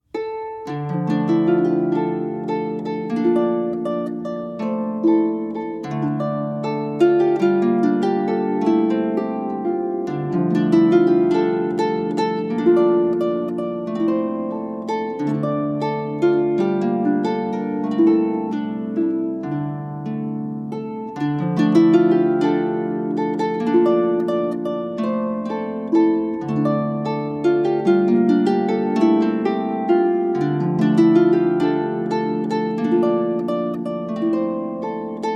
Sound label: Welsh Tune, Harp